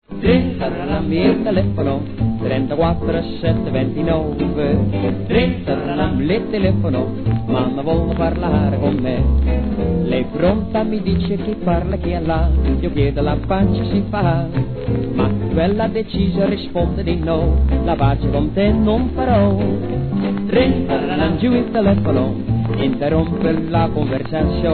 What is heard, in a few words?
QUARTET; 78 giri FONIT